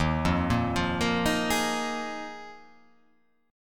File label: Eb Suspended 2nd Suspended 4th, chord; Ebsus2sus4 arpeggiated; JGuitar